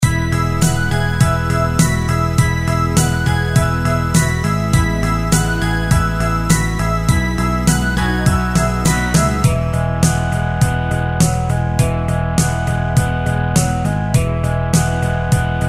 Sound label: Mid-tempo 8-beat Rock; Preset Styles